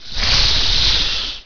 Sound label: eel